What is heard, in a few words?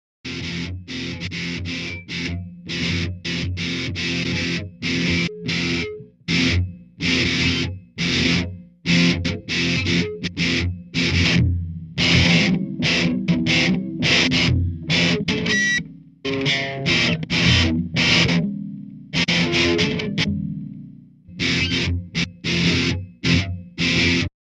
guitar